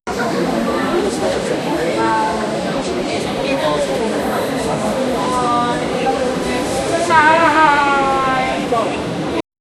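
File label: a stock market candle jump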